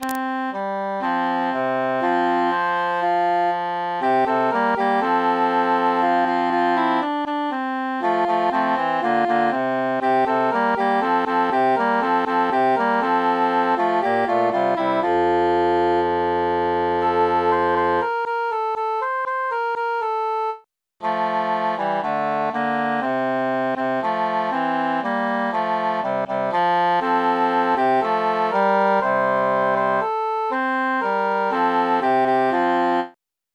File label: altus mute; psallite unigenito